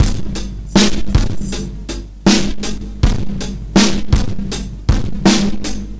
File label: R&B Drums